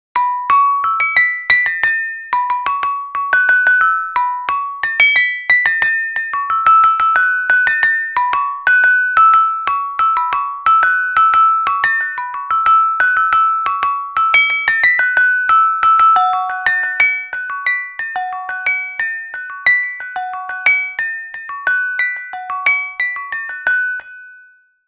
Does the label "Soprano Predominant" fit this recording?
No